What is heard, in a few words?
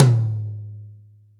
Yamaha Maple Custom Tom Mid